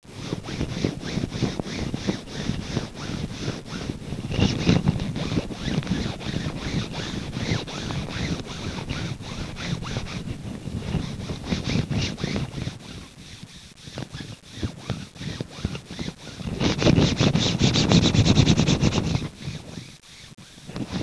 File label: paper nylon